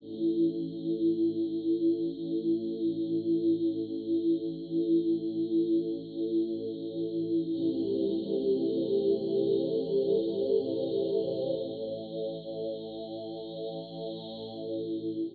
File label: SYNTHPAD ETHNO 125 A; eJay; Sphere